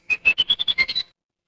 animal bird

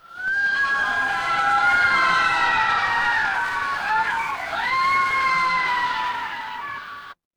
crowd cheer

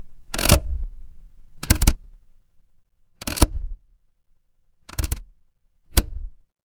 mechanical lever

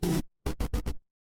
retro game game over

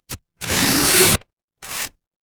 paper fabric tear

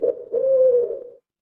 animal owl

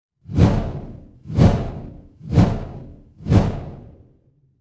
fire electric whoosh flame

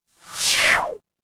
ui swipe

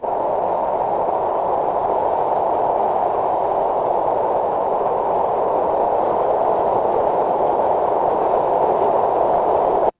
ambience wind

